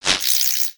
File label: Spiderling Stunned Struggle; creatures mechanical